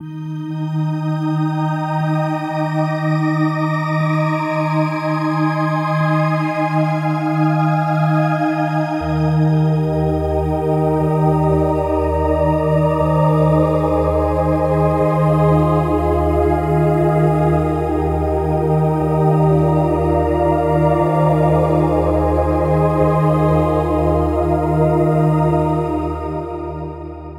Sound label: Vocal Silk; KORG OASYS; oasys assault